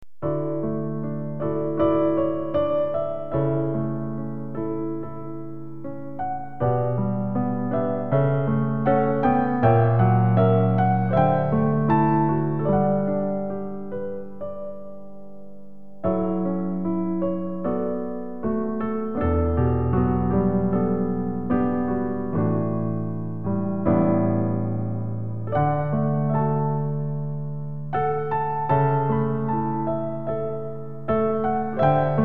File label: improvisation